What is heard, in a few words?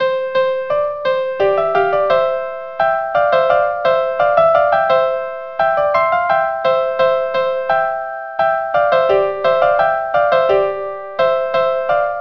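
violin violin; Corrette; Cotillon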